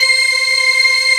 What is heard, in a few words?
organ, elixia organ; Best Service ProSamples vol.10; House; AKAI